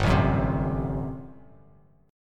G Major 7th Suspended 2nd Suspended 4th G down Bass Standard; GM7sus2sus4 strummed